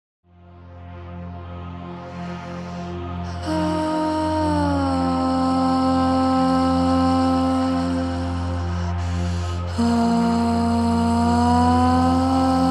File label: Anahata Chant